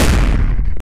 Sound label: hard modern movie trailer; amplification